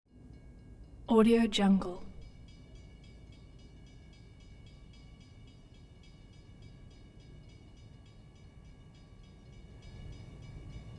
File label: commuter train pulls into station and stops